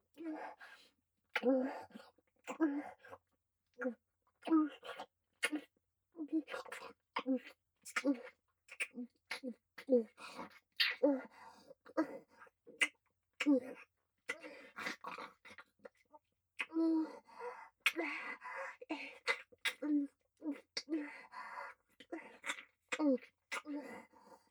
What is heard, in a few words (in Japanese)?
タマ舐め